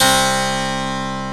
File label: HARPSICHORD